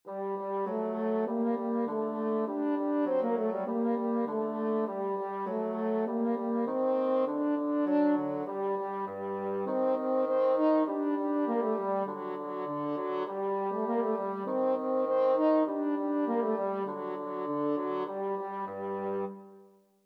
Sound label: french horn bassoon duet